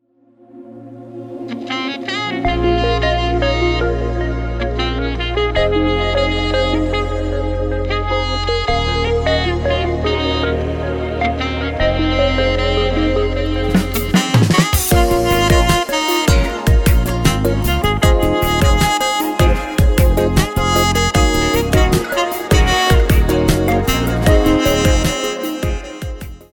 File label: Ring